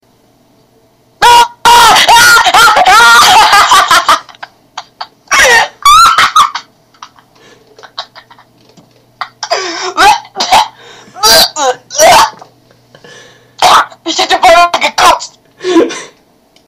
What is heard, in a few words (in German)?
lachen